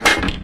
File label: smash, Weapons